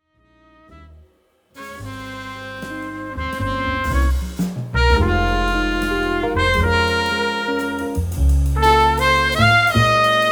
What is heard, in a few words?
Waltz; insurgent